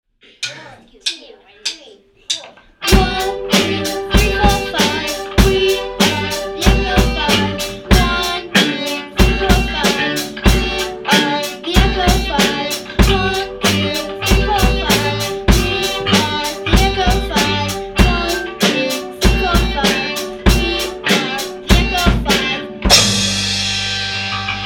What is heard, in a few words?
Echo; rockbandacademy